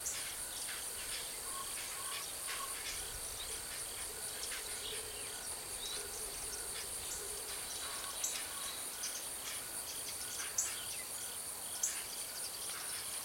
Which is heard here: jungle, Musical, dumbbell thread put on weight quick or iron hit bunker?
jungle